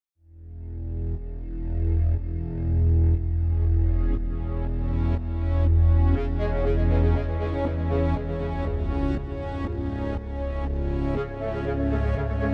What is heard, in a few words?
aggressive; wave cre8